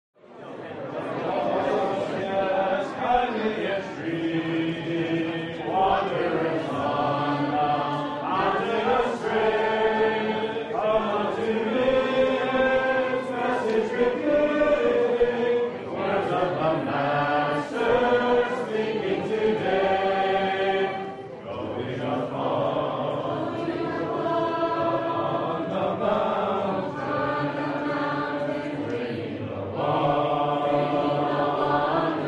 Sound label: Jesus the Lamb for Sinners Slain hymn, Hymn Sing; 2015Conference